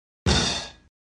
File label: drum and cymbal hit